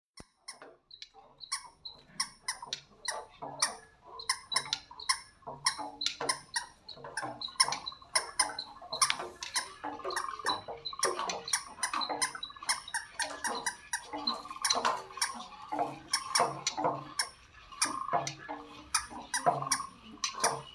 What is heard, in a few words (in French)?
paysage sonore jardin